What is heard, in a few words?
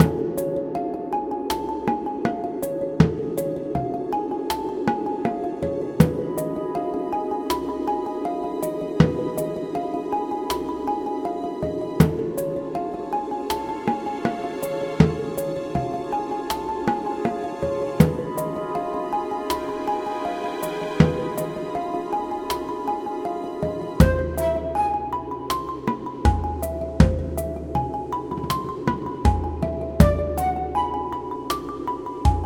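Standard Variation Outdoor Loop; Birdhouse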